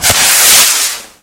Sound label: spray start